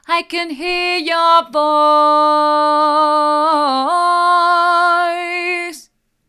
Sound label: i can hear your voice hard, vocal